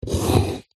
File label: foley wood scrape sharp knife score single longer; zapsplat